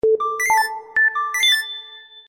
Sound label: Mixed Sms